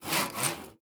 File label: construction saw